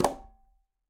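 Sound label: Quinto C Flam Mute, Conga; Latin Percussion; Drums; Drum Hits; Ableton; Factory Packs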